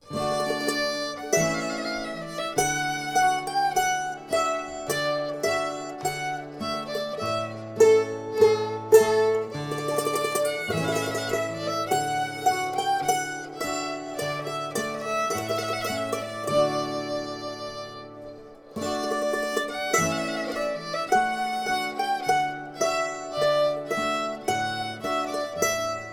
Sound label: trumpet voluntary, string band